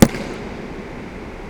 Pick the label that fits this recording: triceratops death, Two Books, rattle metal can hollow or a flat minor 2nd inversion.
Two Books